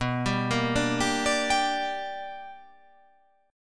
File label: B Minor Major 7th Sharp 5th, chord